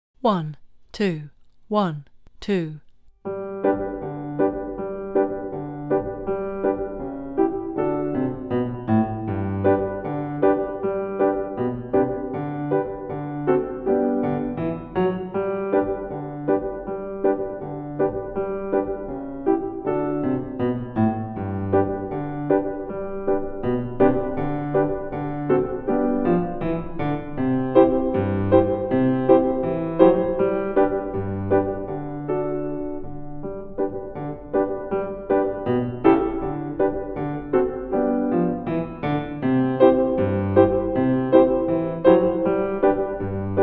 backing, in G; Oh Susanna

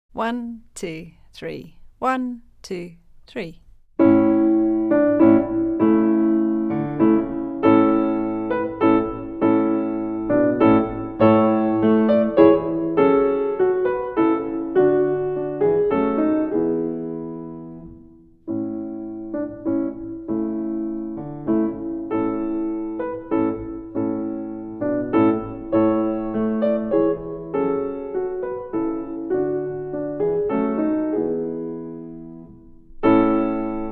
Aire backing

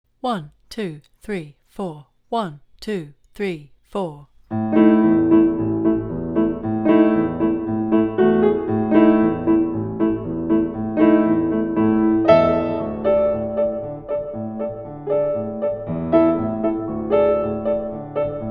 Hungarian Folk Song backing

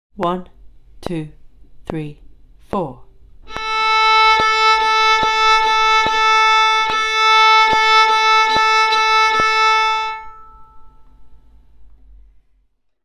Bowing word rhythms

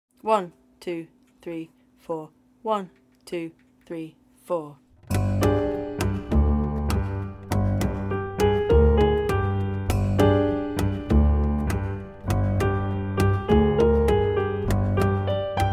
Arabic Dance backing

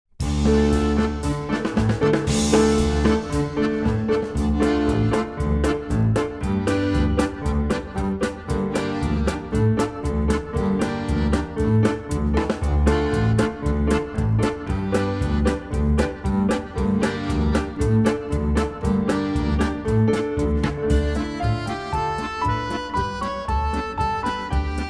Transylvanian Stick Dance backing

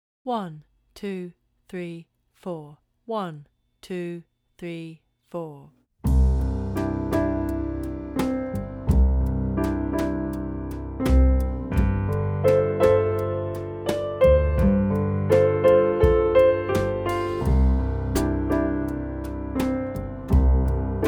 Roll Rock

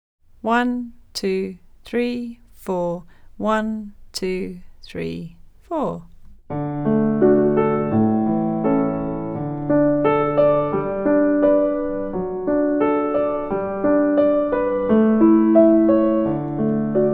D major scale backing

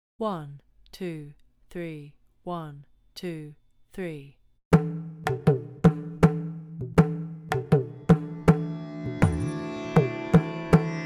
Indian Improvisation backing

